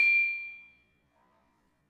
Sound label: Panerus 6 G, gamelan tuned; gravityharps